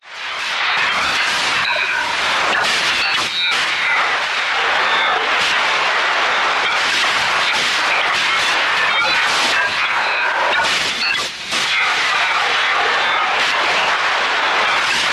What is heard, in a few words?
futuristic radio interference chatter magic spell cast conjure craft mobile app